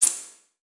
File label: Multimedia Internet CD-Rom Flash Click Mechanical